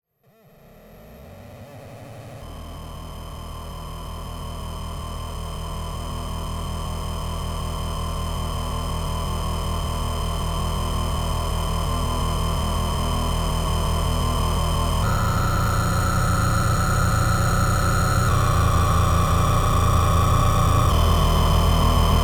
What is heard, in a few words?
Noise Exploration